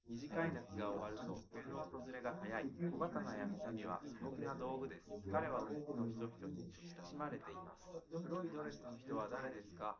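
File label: male, live recording 250ms 5cm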